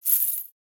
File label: vcoin received